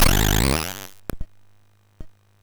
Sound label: Aurum, MOS6581R4